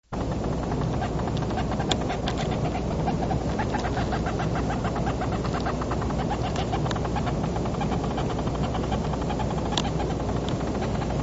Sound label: Texas leafcutting ant